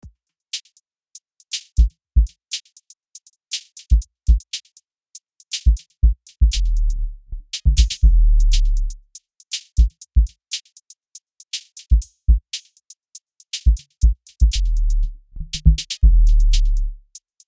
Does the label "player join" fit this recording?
No